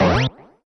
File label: menu up down